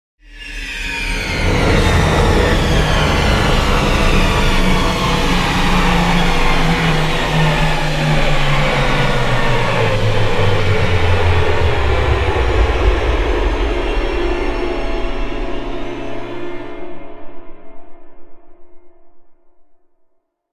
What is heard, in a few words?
Orchestral Faller; Epic Tools vol. 1